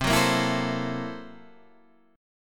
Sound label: Fsharp 7th Sharp 9th Flat 5th, guitar chord